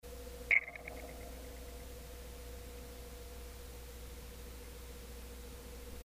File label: rain stops; Aeolian; aeolian night